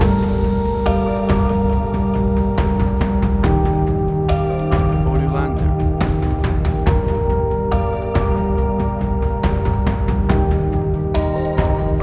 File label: Ambient Pad Tension; 60 SEC